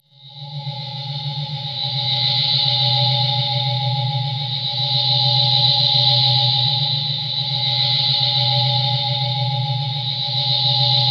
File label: Amen Squished; Drum & Bass; FX; AMG - Prototype Drum & Bass